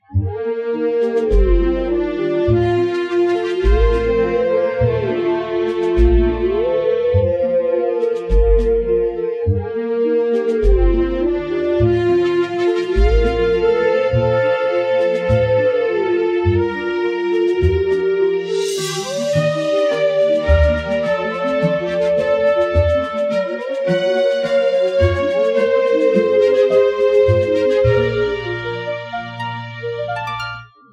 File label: Howl Song, Duo; the legend of zelda twilight princess; Ballad of Twilight